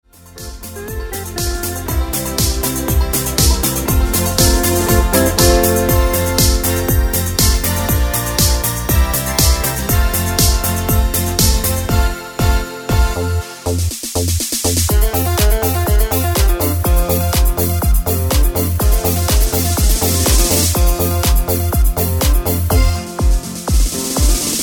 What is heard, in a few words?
country MIX song style voice, Korg PA3X